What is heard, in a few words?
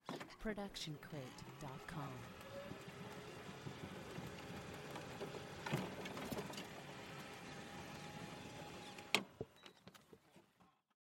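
golf cart sitting and steering; stereo; soundscrate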